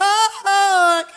"oh ho", sung; Voice

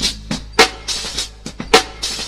creeping bassline, Boombap drum loops

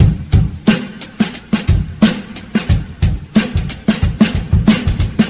clunks, drum loops

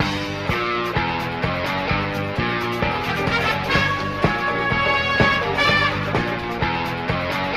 guitar and horns, Phonky Loops

